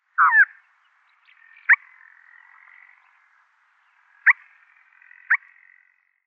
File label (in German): Rennvogel Cursorius cursor